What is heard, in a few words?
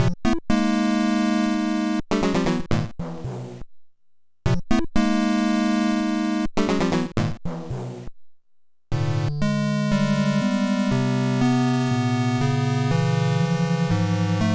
Castle Game Fast